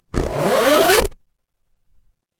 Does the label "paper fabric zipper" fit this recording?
Yes